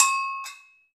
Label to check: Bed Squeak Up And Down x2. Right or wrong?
Wrong